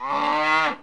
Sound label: cow